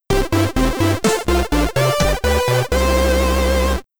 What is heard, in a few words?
Stage Clear!, commodore 64; Eskimo Games